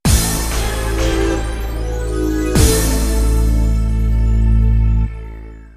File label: Lose; Daisenryaku Exceed II; PS Vita; PS3